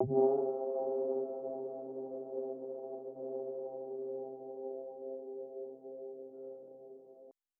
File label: chip synth